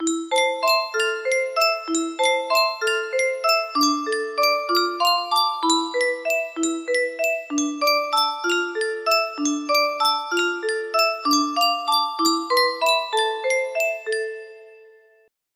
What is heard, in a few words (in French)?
Yunsheng boite a musique